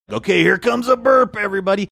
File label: okay here comes the burp